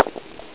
Dice Hit; PC; MARIOSFUNDAMENTALS

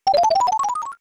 battle item; SNES; SMRPG

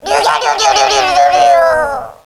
woododododo; DS; ML BOWSERS INSIDE; Fawful

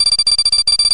enemy Aiming At Yoshi; N64; YOSHISISLAND

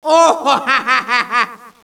ohhh hahahaha, Echo inside caves, underground; DS; ML BOWSERS INSIDE; Luigi

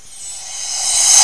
Reverse Cymbal; PC; MARIOTEACHESTYPING2

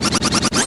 yoshi Hover Short; N64; YOSHISISLAND

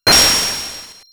enemy crystal; SNES; SMRPG

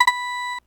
menu select; SNES; SMK

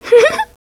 laugh; DS; ML BOWSERSINSIDE; Peach

